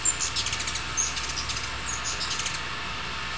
Ruby throated Hummingbird

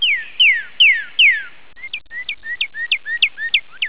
cardinal

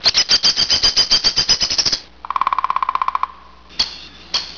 Downy Woodpecker

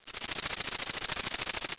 junco

